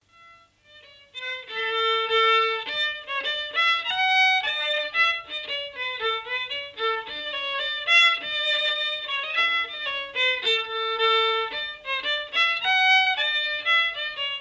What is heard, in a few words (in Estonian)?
Vegaleiken Teelugu, Ruhnu, viiul, folk; Elias Schonberg